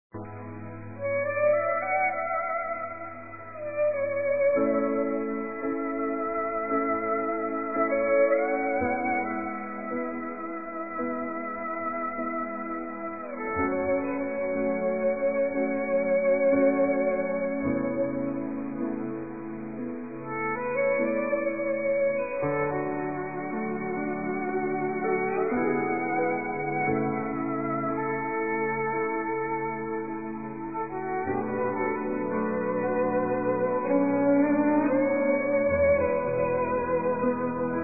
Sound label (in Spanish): melodia intrumental